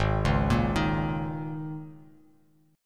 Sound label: Ab Suspended 2nd Suspended 4th Ab down Bass Standard; arpeggiated; JGuitar